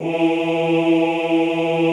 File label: OOH E2, Classical Choir; Best Service; Extended Classical Choir